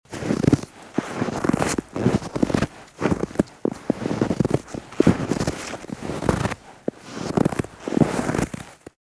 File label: steps in snow